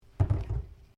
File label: Bottle Plastic Place Bench; Various bottles; Plastic (Sound Effects:Materials)